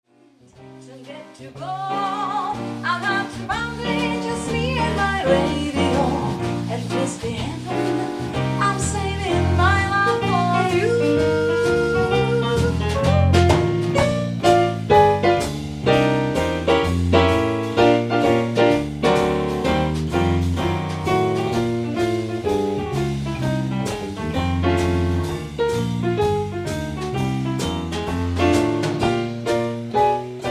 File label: jazz standard